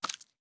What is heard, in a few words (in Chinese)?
青蛙进食声